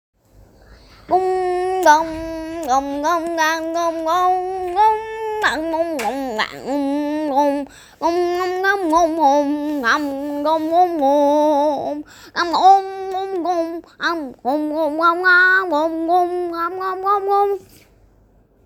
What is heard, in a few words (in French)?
Karaoké; Vietnam